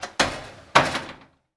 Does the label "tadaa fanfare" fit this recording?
No